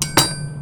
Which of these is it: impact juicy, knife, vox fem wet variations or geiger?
knife